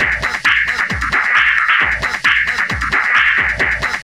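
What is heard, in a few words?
drum loop; X-Static Goldmine 3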